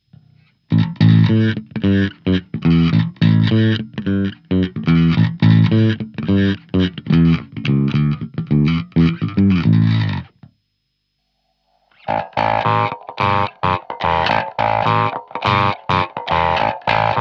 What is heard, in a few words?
Ring Mod